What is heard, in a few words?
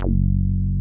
HOUSE BASS; Masterbits; Dance Trax